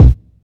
dry kick single shot b key, Royality free kick drum tuned to the B note. Loudest frequency: 126